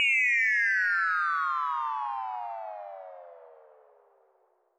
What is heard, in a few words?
Sweep down